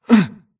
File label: grunt hits; Titanfall 2